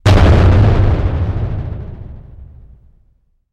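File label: Impacts Rattles Heavy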